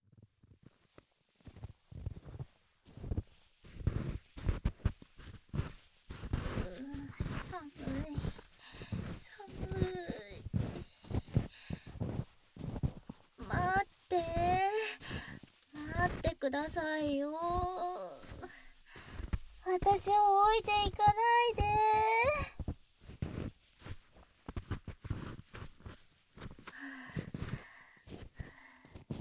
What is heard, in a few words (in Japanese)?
氷河の世界 零下の耳舐め, ASMR